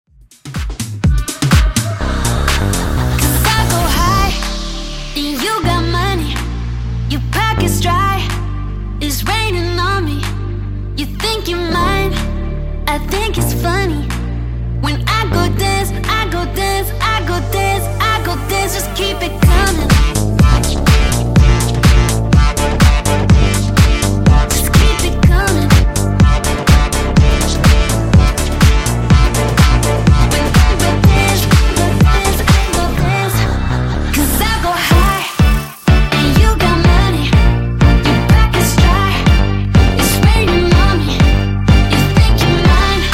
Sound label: 124 Bpm